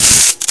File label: electric; de code